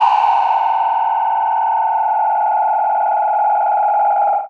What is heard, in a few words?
Tape Delay Feedback; radiosonics